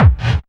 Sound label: KICK hardcore, Techno Trance DRUMS